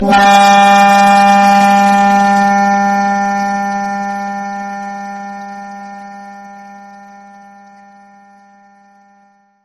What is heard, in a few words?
lobotomy sound effect